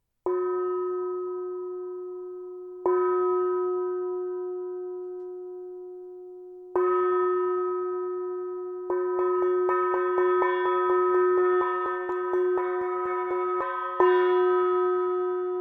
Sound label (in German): tischgong